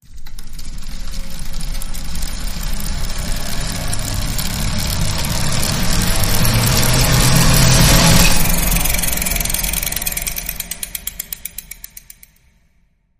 Pedal Cycle Rise

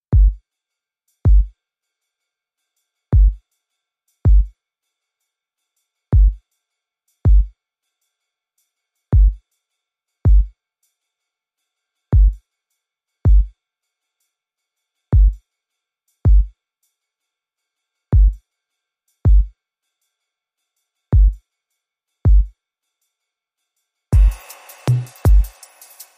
Minimal Beat

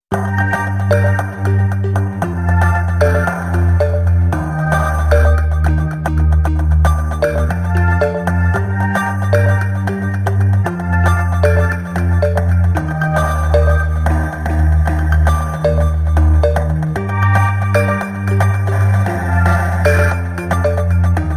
Industrial Melancholia

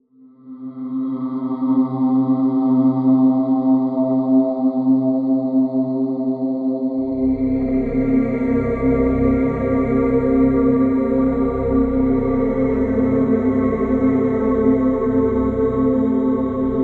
Vocals Solitary Pad

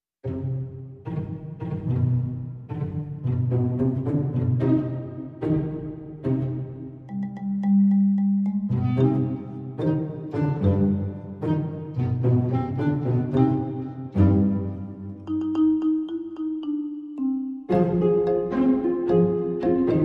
Tango, No Perc

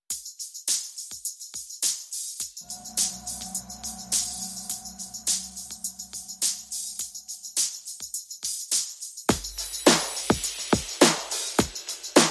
Drums Only

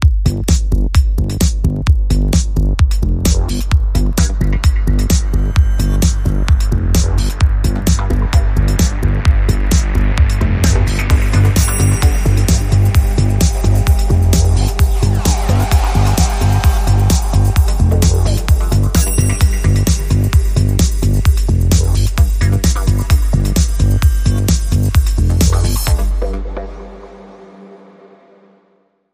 Techbeat

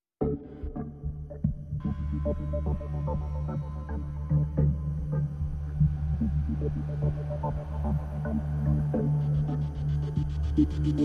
Pads and Pulses

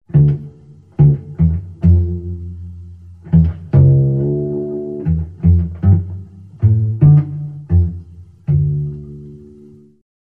Sneaky Pizzicato Cello

